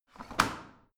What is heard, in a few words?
washing machine drawer in; haus garten; haushalt